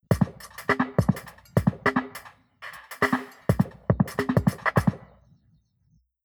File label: drum